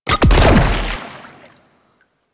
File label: Fishing Capture Success; Warframe; Venus Fishing Spears